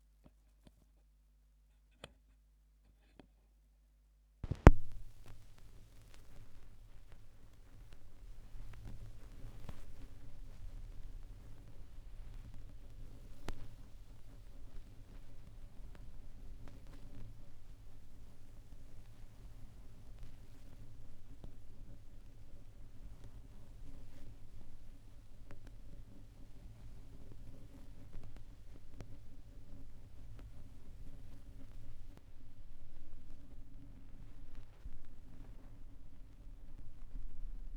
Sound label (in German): schallplattengeraeusche, Vinyl